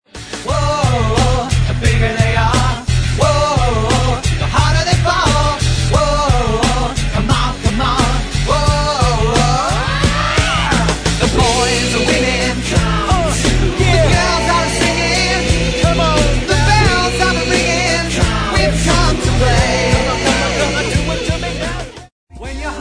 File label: Singing